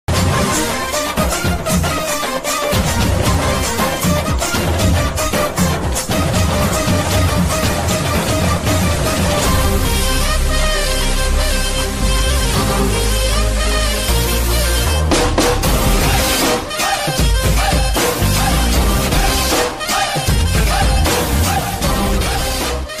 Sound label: ringtone beats; pudhupettai